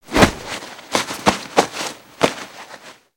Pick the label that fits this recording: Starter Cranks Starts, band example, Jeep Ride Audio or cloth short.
cloth short